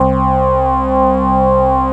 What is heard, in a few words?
ORGAN, Drum & Bass 160 BPM; Ueberschall; Drum & Bass Flash Trackz